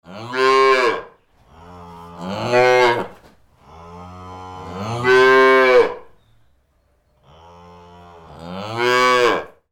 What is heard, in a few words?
calves